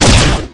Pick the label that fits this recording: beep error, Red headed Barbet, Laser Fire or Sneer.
Laser Fire